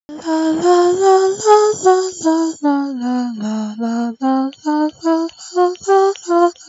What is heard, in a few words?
autotuning before